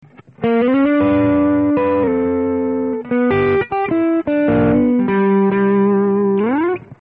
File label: gibson neck dirty; Neck Pickup, DIRTY